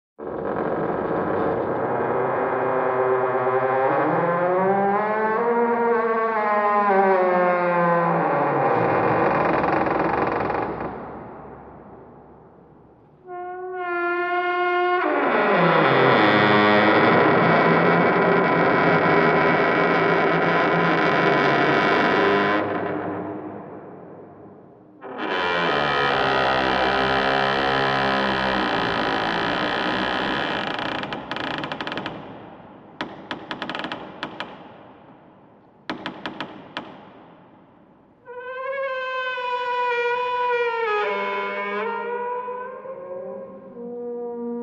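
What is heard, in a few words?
Long Metal Hinge Squeak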